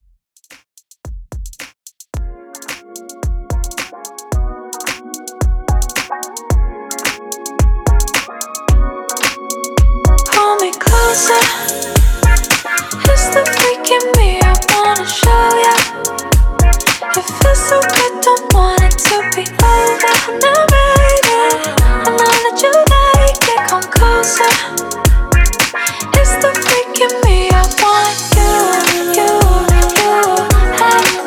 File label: 110 BPM